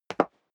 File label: step high heels wood parquet single stair up; schritte